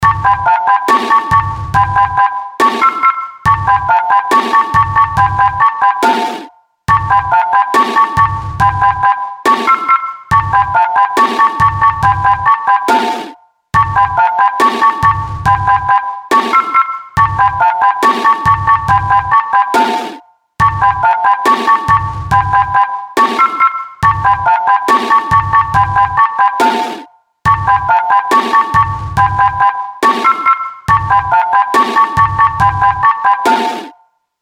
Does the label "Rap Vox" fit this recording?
No